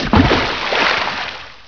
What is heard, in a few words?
splash; Sonic1